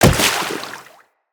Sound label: Tenno Wall Jump Water, footsteps, wall run; Characters